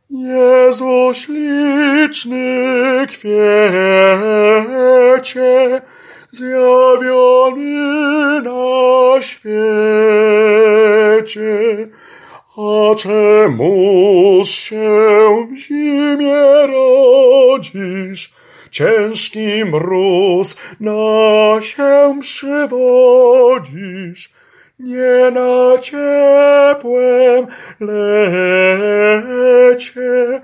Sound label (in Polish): TENOR; Jezu sliczny